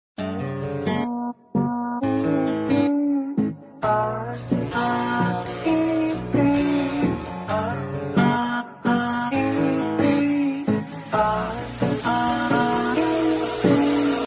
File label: ringtone slowed